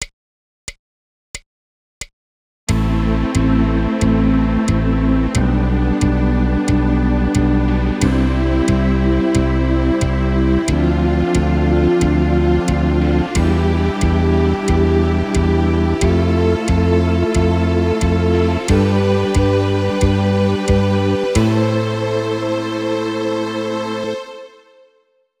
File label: Climbing in Am; Drum 1